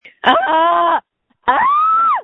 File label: screams